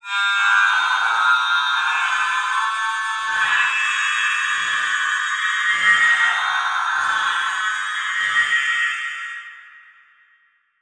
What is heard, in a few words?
Title Transition Stab Dark Long; Production Element